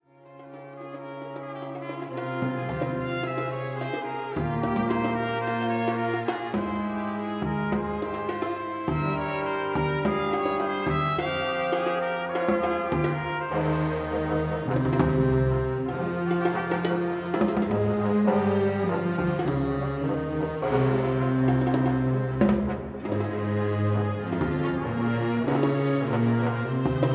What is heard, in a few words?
Orchestra